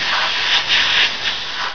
hose pipe

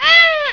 BABY CRY; VOICES

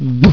KISS SMACK; PEOPLE

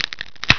SNAP

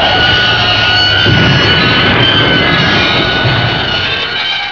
CRASH BIG; IMPACTS

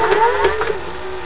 SERVO, MACHINES

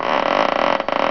CREAK

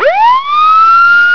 SIREN HI; ALARMS

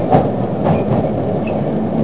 RAIL CAR; TRANSPOR

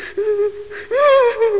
CRYING; PEOPLE

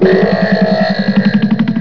TWANG RVB; EFFECTS

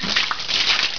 water

